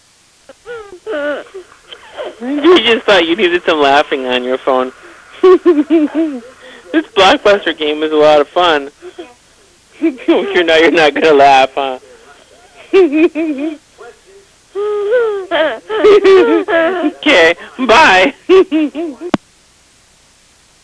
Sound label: laughing